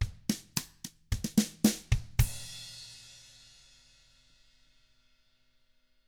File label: COUNTRY, Drums; 111COUNTRY